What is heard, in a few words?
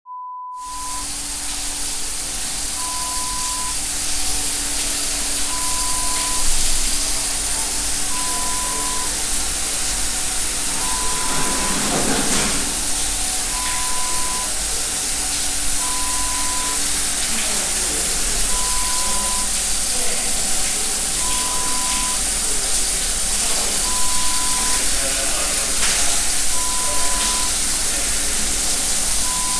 Gym Showers sound